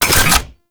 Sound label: Pump Shotgun Alt Mech C; Weapons; Warframe; Zariman